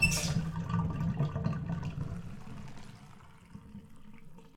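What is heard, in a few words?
Embalming off; Phasmophobia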